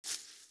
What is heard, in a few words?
FS Grass, footsteps